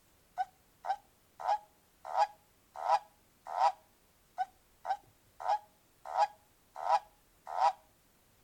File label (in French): crapaud epineux